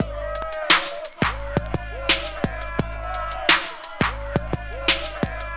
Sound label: Drum loops, Electro